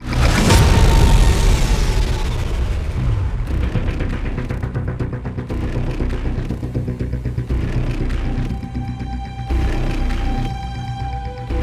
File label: Countdown Match Start; Modern Combat Versus